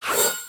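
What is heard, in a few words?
symbol stop; La Gran Aventura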